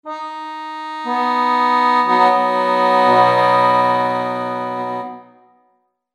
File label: Tuning Notes